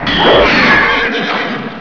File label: glass island; Crystal Caliburn™ DEMO